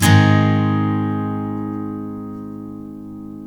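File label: steel G# major up, CHORDS STEEL, Guitars; Spectrasonics; Hans Zimmer Guitars VOL-1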